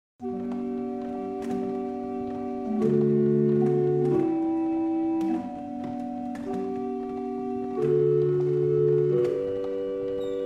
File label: barrel organ; Liddington